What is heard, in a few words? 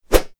melee swing